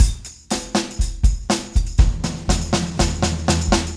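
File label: 120 intro beat; Big Fish Audio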